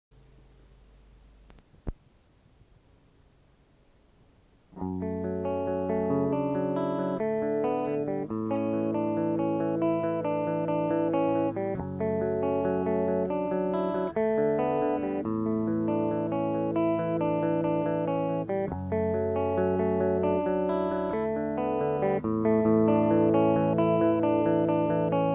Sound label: Acoustic Plus Bass; Demos